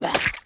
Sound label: Boss Zombie Attack; ZombieBattle; audio zombie